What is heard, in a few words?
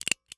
Household Stapler Staple Empty